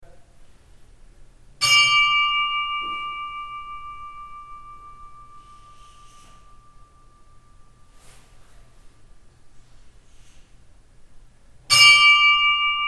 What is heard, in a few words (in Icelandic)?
modrudalskirkja likhringing, kirkjuklukkur